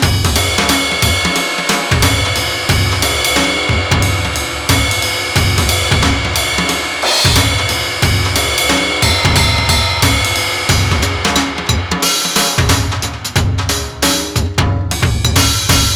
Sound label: Shuffle Beat